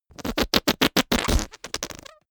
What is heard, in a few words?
whoopee cushion fart stutter